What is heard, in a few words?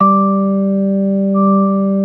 B3 G 4; Best Service; Hyper Dance